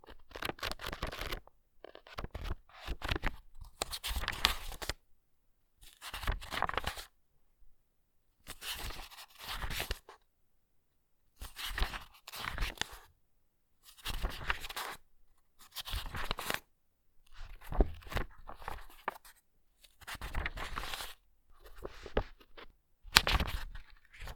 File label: Book Pages